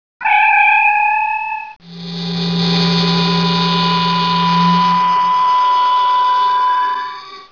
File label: Dematerialize; StarTrek Demo; Star Trek Audio